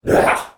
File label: Demon; little robot sound factory